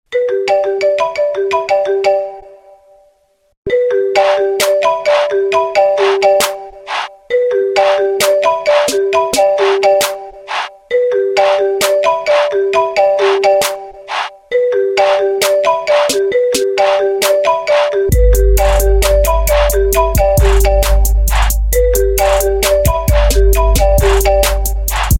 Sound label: iPhone REMIX, ringtone